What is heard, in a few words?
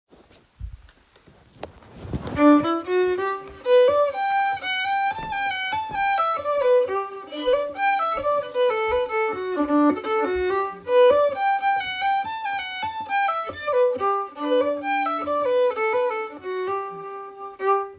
Reel de Lois Gagnon Fiddle; reel de louis gagnon